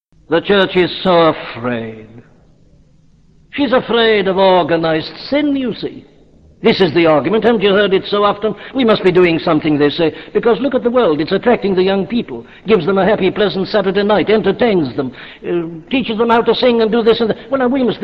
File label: skiffle